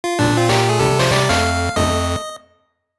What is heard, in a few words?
Bonus Clear; gb